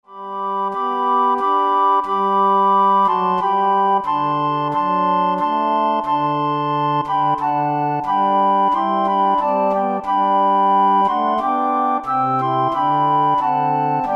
Flute Ensemble

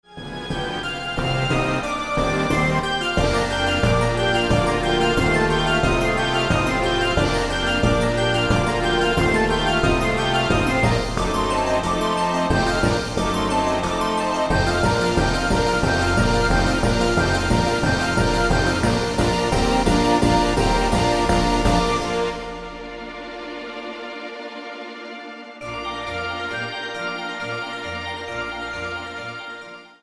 Nutcracker Final Waltz and Apotheosis

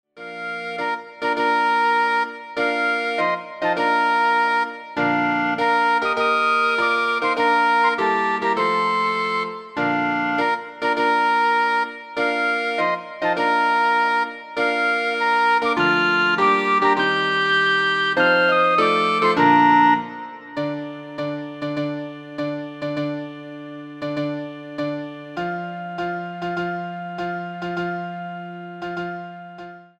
Flute Clarinet

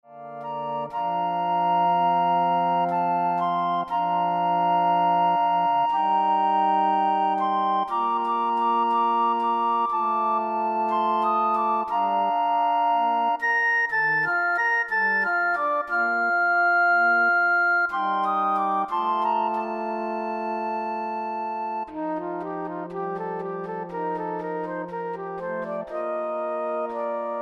Flute Ensemble

